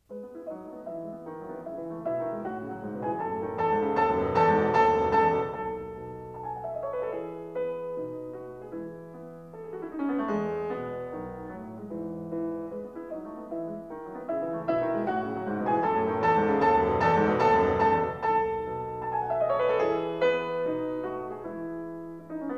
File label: Piano Sonata No. 9 in E major; Wilhelm Kempff; Beethoven; Piano Sonatas